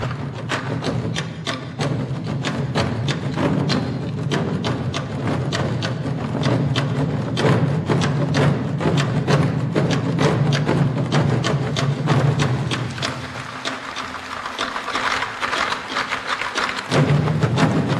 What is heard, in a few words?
korean Drum